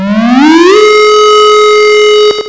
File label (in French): sirene; hauteur